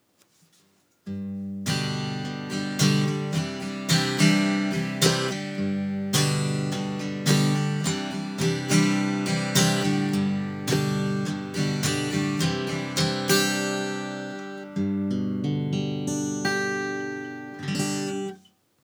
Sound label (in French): guitare acoustique